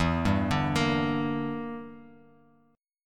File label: E Minor 7th Flat 5th E down Bass Standard; Em7b5; arpeggiated; JGuitar